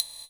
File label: TIK GATE